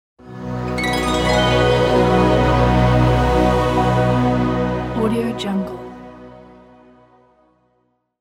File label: heaven angel